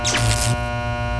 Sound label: sparking transformer